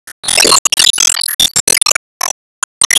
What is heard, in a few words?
Tidalwave Shot